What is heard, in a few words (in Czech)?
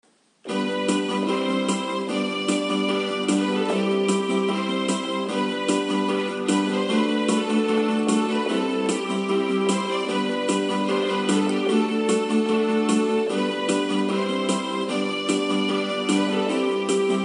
klavesy